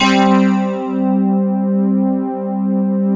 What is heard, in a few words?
SYN, Wavestation; Sound & Vision - Gigapack I CD 2